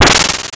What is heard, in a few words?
freeze